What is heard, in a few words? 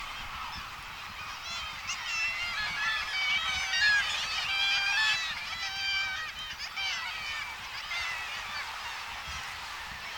Black legged kittiwake; پرنده کاکایی پاسیاه